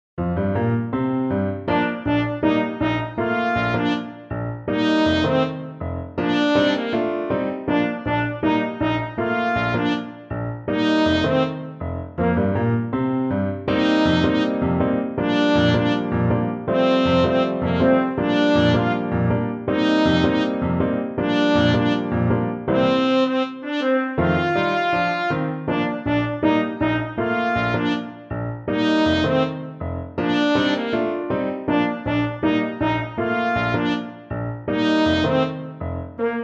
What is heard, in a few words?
trumpet